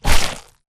Mvmt FS Jog Mud Layer; AI Stalker; Titanfall 2

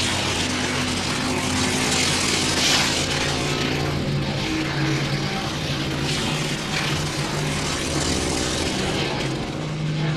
generator damage arcs; coop; Titanfall 2

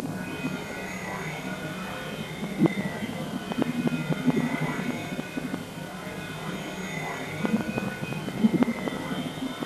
digital distortion; Titanfall 2

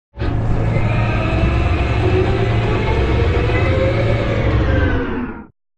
Giant Floor Panel Assemble Final Lift Servo

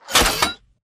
ammo box close; coop; Titanfall 2

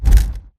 bt footstep jog parts; Titanfall 2

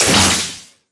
imp bullet lightballistic shieldwall sizzle

